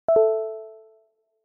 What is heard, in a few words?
harp two notes